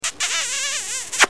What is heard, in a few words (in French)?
Bisou